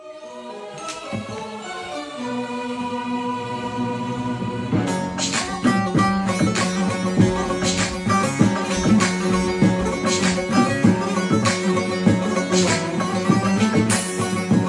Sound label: mobile ringtones